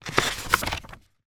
household book page turn; zapsplat

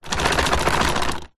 plastic hollow large shake rattle; foley

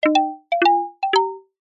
cartoon footsteps sneaky tip toes wooden mallets; zapsplat

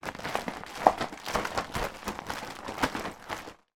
household pushchair plastic rain cover shake

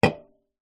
food drink cola can full open set down table; zapsplat

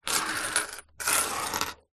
coins several slide on wooden surface

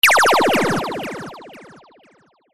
science fiction laser beam ray fire

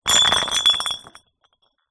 bottles wine empty x8 knock over concrete

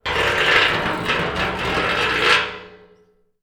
metal pole scrape on empty metal oil drum; zapsplat

